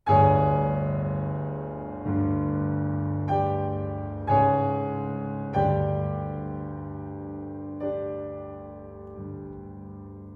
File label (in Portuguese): Sonata Para Oboé e Piano II. Andante. Presto. Tempo. Presto. Andante